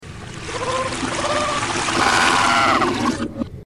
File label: flushed, reverse